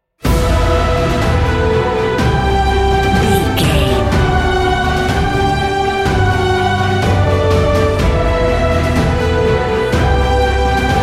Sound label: epic cinematic